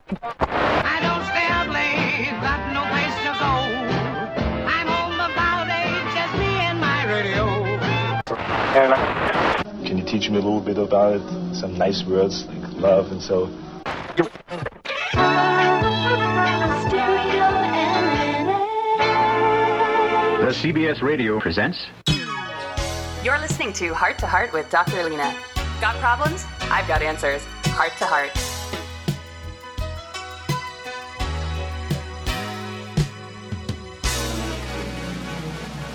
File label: Jingle; Heart2Heart; Purdical